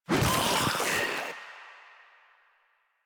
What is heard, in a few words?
Infested Ancient Attack